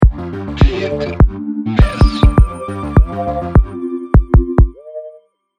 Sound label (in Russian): Джингл; Кликбез; Короткий джингл "Кликбез"